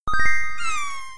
Jingle Yellow Witch

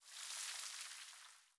Platform Dirt Fall

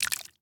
Foley Slime Movement

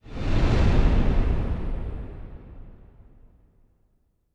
cutScene General Transition

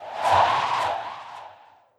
Wind Sirocco Impact

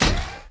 factory boss ground Attack; unsighted; enemies

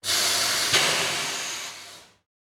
Ambience Scatter Machines; Josh Journey Darkness Totems; Santuario

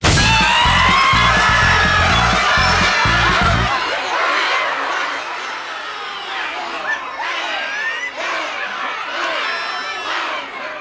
Jingle, You got a reward!; rayman raving rabbids playstation 2

